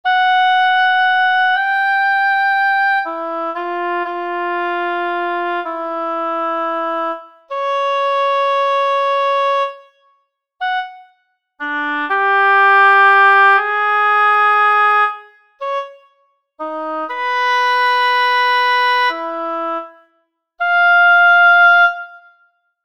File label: oboe